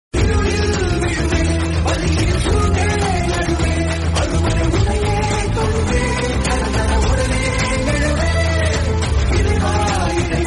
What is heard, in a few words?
tamil song